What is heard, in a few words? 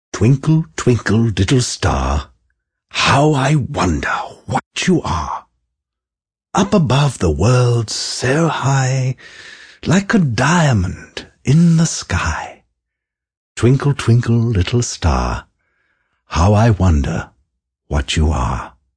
Twinkle Twinkle, Male, Singing; VoiceBox